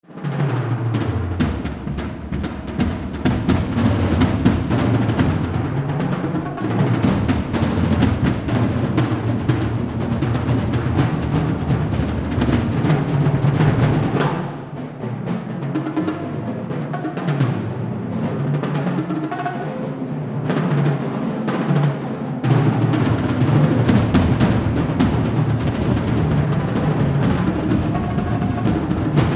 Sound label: Perc Conc Band